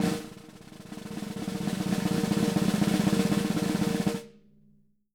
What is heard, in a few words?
SN ROLL, SD ROLLS, Living Drums; Sampleheads; Peter Erskine